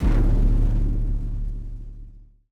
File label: Explosive Sub Shot Gun Layer; Nova Sound